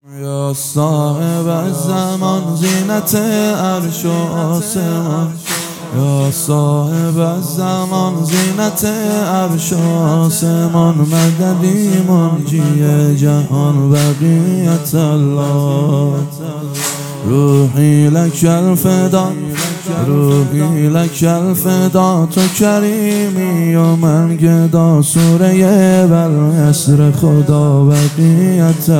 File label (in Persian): مداحی احساسی